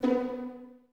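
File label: Violins B3 pizzicato, String Ensembles; Miroslav Vitous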